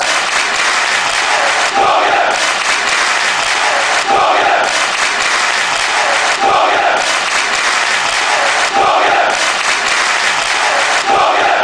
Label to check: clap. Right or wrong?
Right